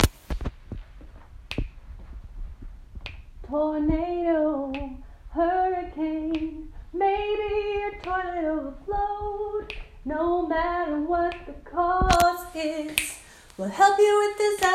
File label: jingle